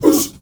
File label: MALE WAR, Heart of Africa, shout; Spectrasonics; Heart of Africa 1; CH. SHOUT2